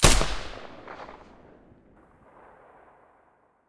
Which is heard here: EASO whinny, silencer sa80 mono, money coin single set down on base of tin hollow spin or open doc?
silencer sa80 mono